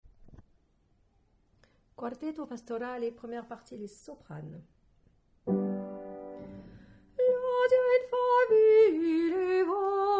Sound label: Soprano; quartetto